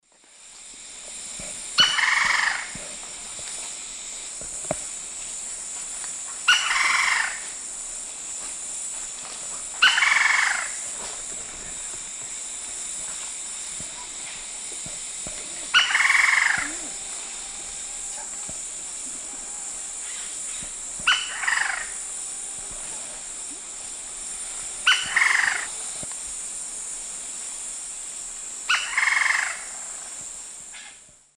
Dryocopus lineatus, alarm call; S. America; Eastern Lowlands

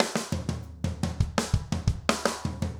jungle 170 fill; Sound Effect Library

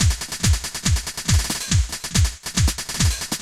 techno 140

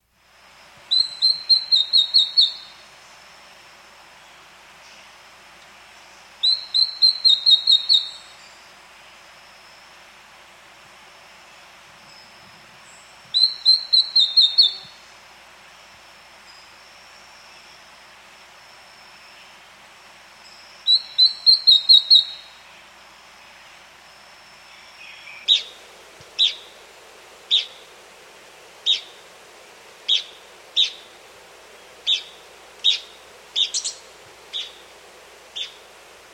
Dull-Mantled Antbird; S. America

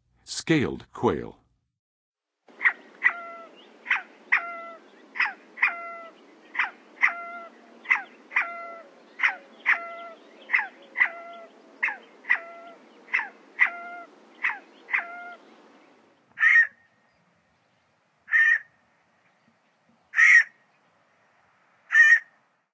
Scaled Quail, Birds of North America; Western USA; Western USA Songbirds

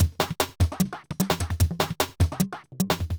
murder 150

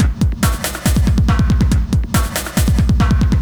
ind 140 kit

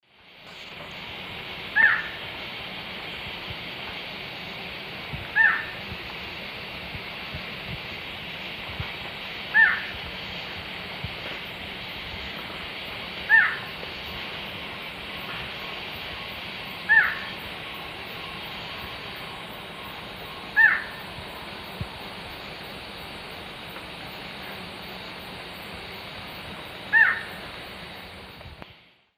Thamnophilus murinus, call; S. America; Eastern Lowlands

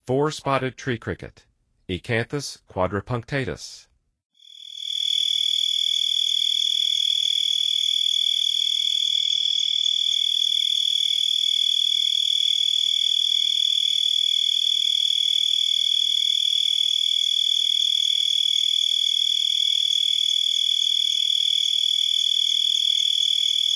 Four-spotted Tree Cricket; N. America

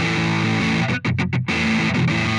Pushing Riff, Rock Guitars

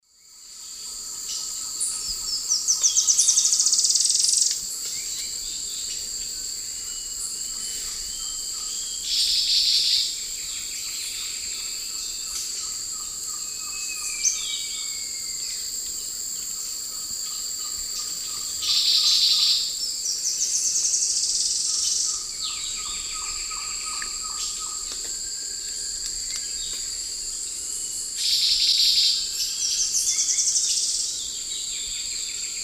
Terenura humeralis, song; S. America; Eastern Lowlands

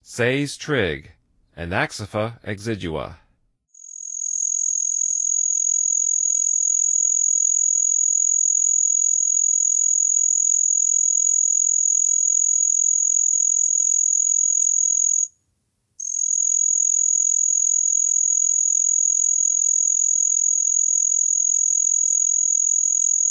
Says Trig, Songs of Insects

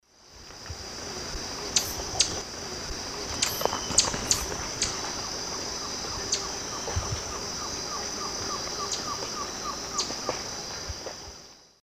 Campylopterus largipennis, feeding call; S. America; Eastern Lowlands

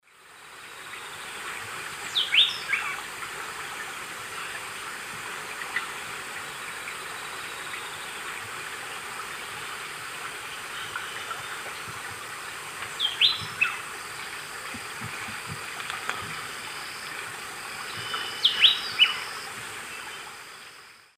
Pheugopedius coraya, song; S. America; Eastern Lowlands

